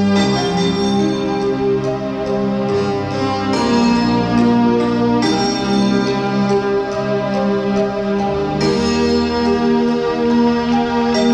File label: Tyger, instrumental